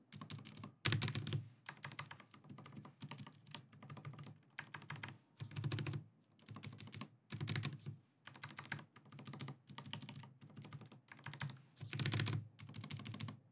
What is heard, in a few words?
stabs; vissles v84